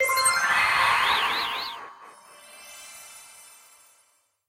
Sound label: explode start; serengetikings